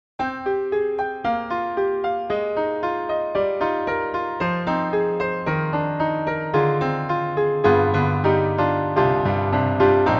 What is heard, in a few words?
Dull Piano; Cakewalk; DropZone